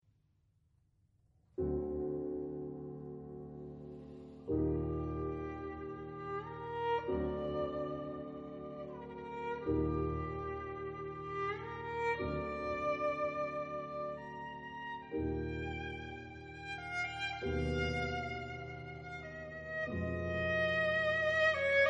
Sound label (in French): Nocturne violon piano